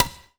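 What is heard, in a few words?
stick reverse; AKAI S-Series CD-ROM Sound Library VOL-5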